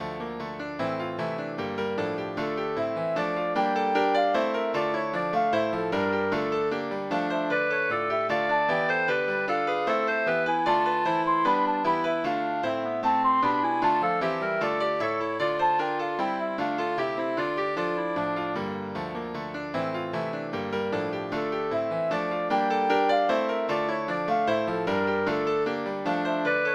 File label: Gigue for flute clarinet and piano